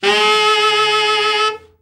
BRA TENOR OB, SAX; Best Service Gigapack 1; BR SAX 4

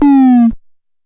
Drop Lo, Stylophone Beatbox

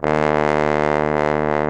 BARITON; Best Service; Alpine Volksmusik

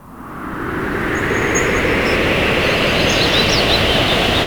NOISE, Production Effects

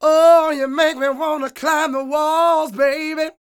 SING, Vocal Planet Blues Soul BLUESY

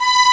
Crash Crash Crash

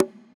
Conga; Equipped Music; Loungin House

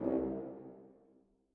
horns stac C2, Brass; BBC

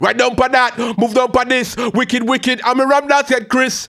RAGGA MUFF, B maj; Sound & Vision - Gigapack II

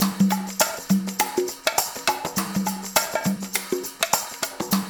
LOOP, Percussion

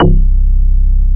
SYN MORP, Roland

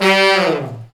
BRS Fat Section, Fat Falls; Roland L-CDX-03

